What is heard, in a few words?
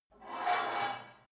take off weight barbell reverby quick, fitnessstudio